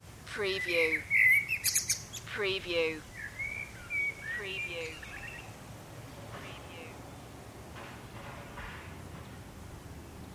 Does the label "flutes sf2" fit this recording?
No